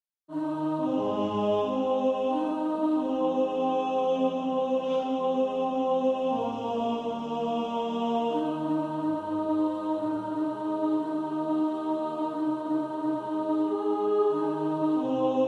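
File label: alto, practice music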